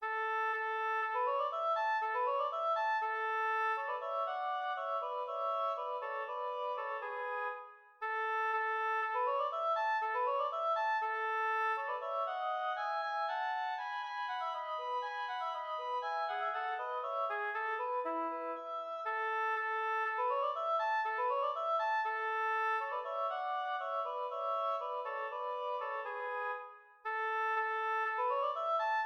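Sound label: duet 2 oboes; molter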